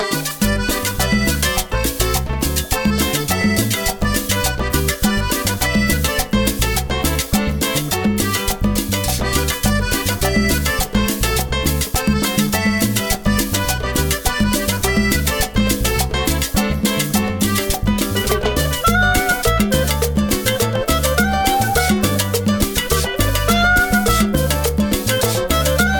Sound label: Music Racing; Total Overdose